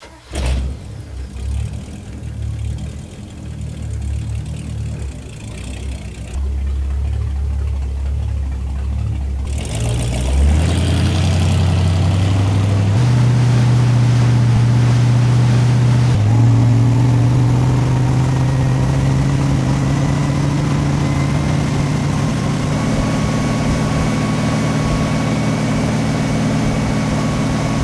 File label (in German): sportboot